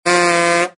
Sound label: comedy honkin big blast